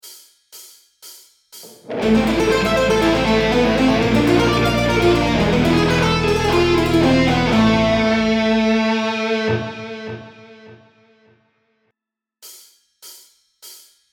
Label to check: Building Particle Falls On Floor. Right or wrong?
Wrong